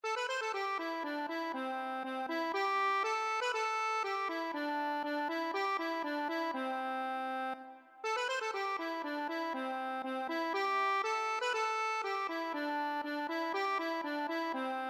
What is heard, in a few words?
accordion